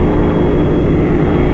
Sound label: air turret fly loop, vehicles; hl2